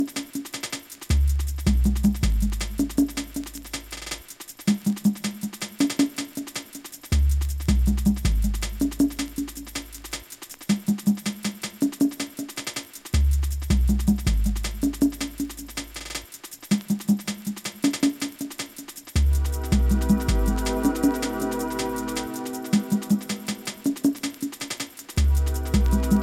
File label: natural space funk